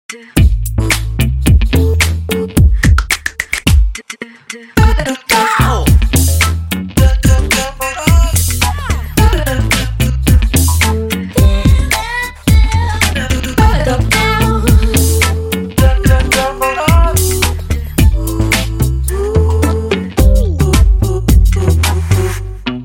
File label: music, sound identity; wiko